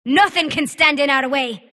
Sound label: sylph attack emote; dota; dark willow